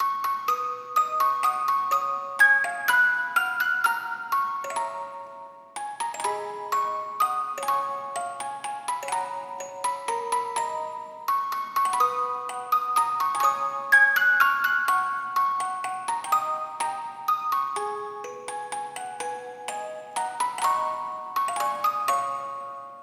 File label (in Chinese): 八音盒铃声